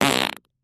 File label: Close Up Fart; farts